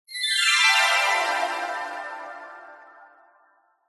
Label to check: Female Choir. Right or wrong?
Wrong